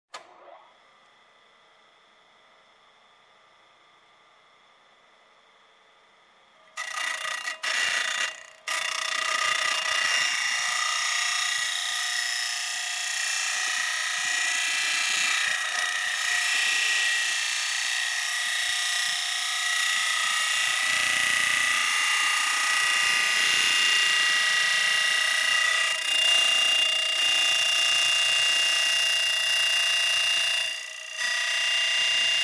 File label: Construction